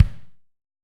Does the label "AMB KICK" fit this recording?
Yes